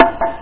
zvuk biti

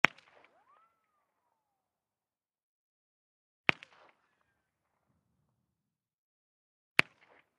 vistreli avtomata mac 10

